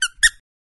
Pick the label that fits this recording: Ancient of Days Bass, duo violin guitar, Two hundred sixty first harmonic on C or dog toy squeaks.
dog toy squeaks